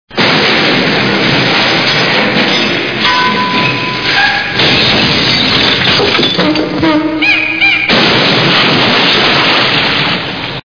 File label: crash